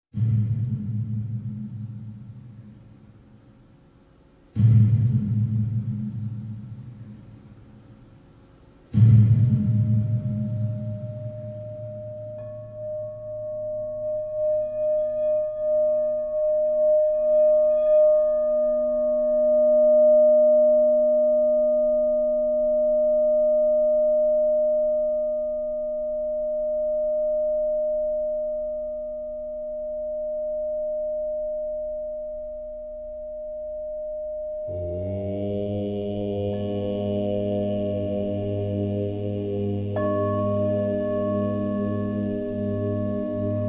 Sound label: cry bowl